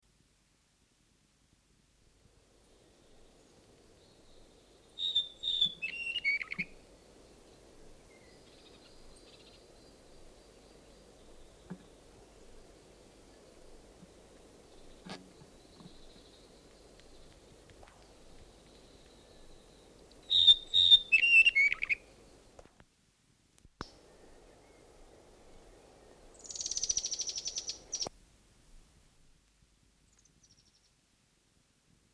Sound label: yellowhead song; native animals